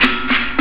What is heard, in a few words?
Ct Shake; jazz jackrabbit 2